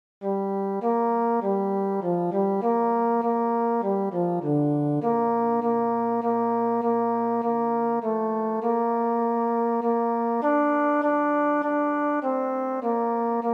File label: tenor